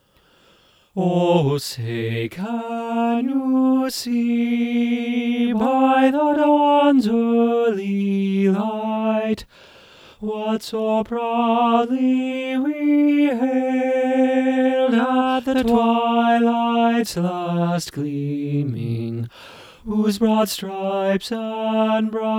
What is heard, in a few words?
tenor middle, choir